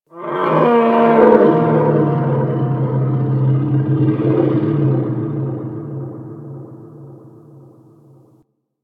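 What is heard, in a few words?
Ocean Various Creatures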